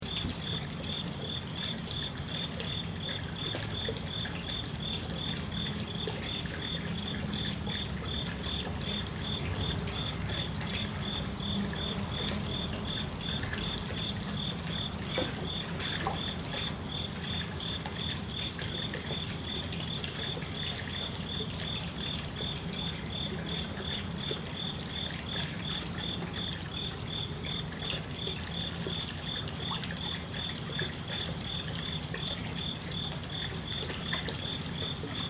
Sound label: Water Trickle Crickets Night Kyoto Japan; Night time in Kyoto, Japan. Crickets, water trickle from pond or wat; Cricket Sound Effects